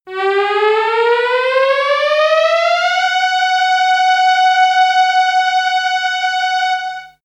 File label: alienated racing car